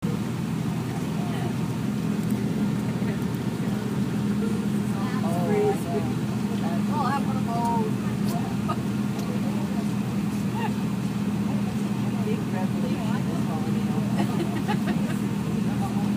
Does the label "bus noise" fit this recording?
Yes